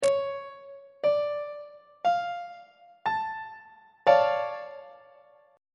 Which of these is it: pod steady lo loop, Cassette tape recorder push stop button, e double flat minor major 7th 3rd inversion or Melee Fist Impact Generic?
e double flat minor major 7th 3rd inversion